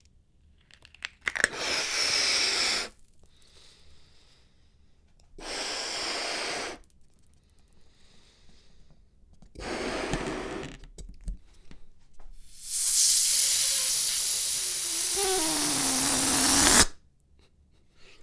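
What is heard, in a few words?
Balloon Inflating And Deflating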